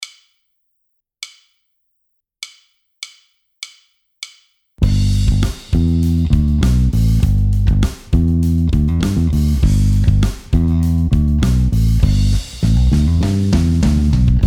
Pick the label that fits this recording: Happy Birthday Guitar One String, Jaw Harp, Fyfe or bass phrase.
bass phrase